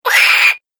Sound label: Nasty Little Monster; horror; Monster Sound Effects